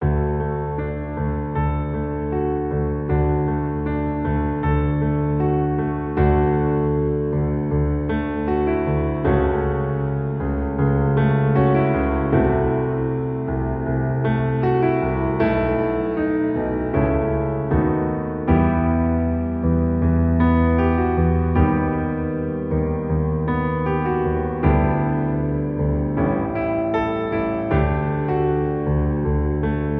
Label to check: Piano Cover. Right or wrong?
Right